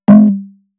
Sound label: Snares, Alesis Performance Pad